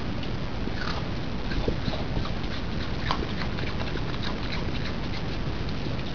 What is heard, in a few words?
shakshak